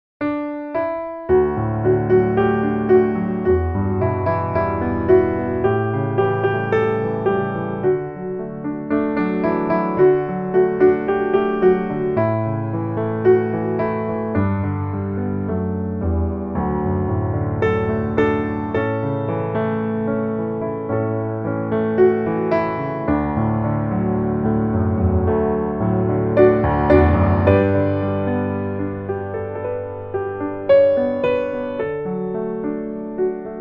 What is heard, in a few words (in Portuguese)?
Que as palavras da minha boca piano